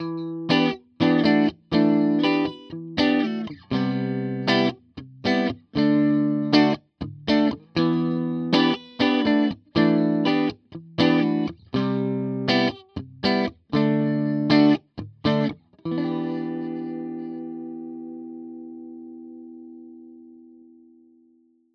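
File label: telecaster clean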